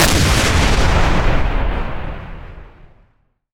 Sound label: big explosion